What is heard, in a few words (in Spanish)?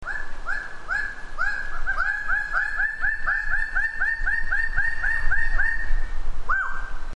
raggi raggiana sonidos cantos aves del paraiso